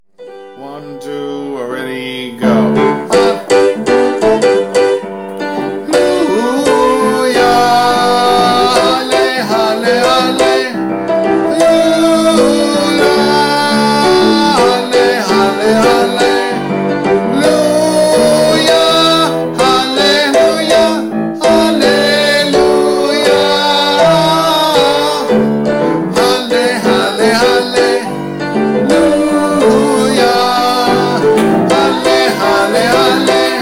Halle Halle Halleluia Sopranos; choir

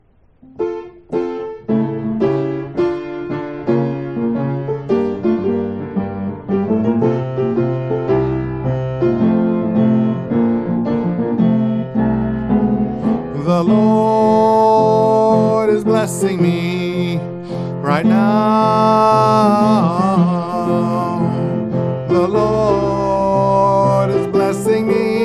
Soprano, choir

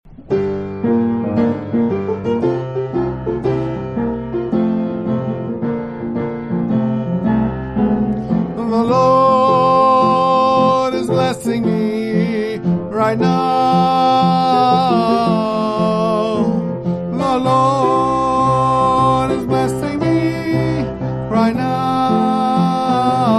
Tenor, choir

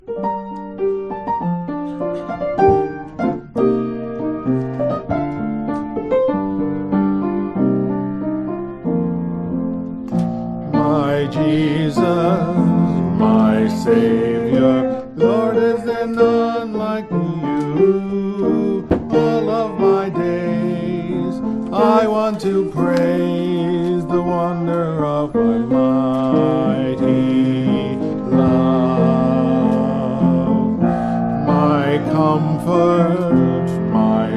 Alto; choir

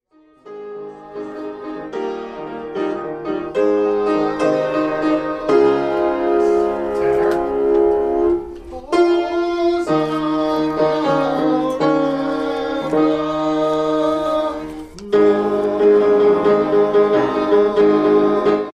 TENOR ENDING; The Holy City; choir

